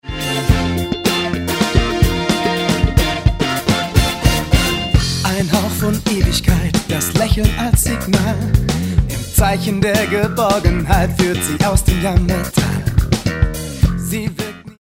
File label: ewigkeit dnb